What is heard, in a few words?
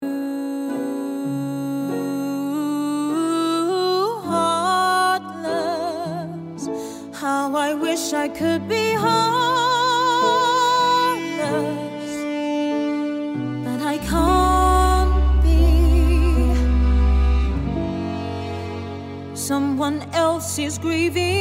Adult Female Cast Recording